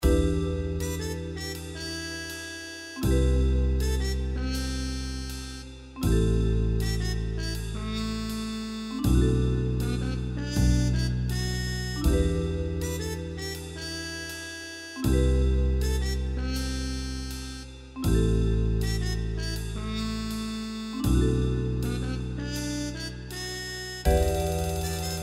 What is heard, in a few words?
Trumpet Blues